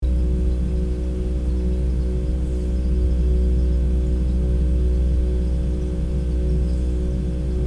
Small kitchen refrigerator hum loop

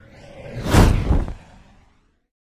Caped superhero zoom past whoosh fast

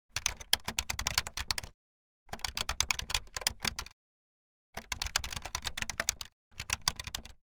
Bluetooth keyboard typing fast

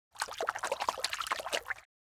Fish wiggle and splash

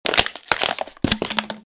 Plastic bottle crush

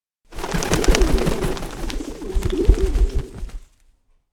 Pigeon flapping wings birds

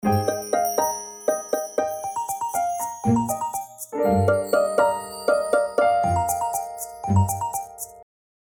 Smartphone ringtone modern call

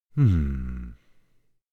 Man thinking hmm

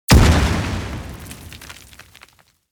Grenade blast with flying debris; explosion

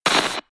TV channel static noise

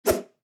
Fast swish

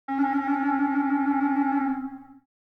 Cartoon clarinet trill

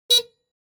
Car single quick honk

